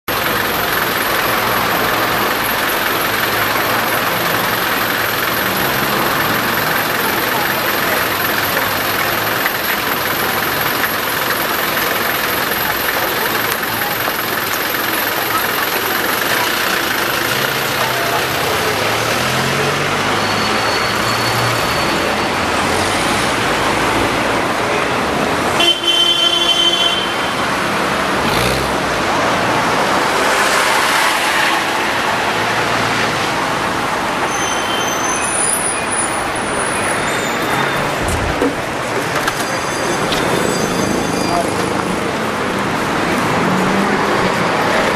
traffic oxford st

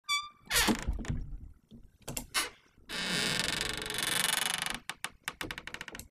door creak

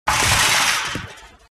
metallic crash

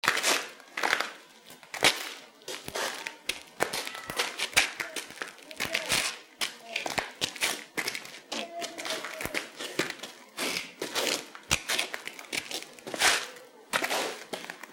two footsteps on stone

